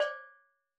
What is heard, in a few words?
Steel Drums Layer D5, Calypso Steel Drum; Percussion